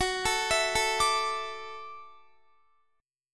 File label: Gb Minor 7th Sharp 5th, Guitar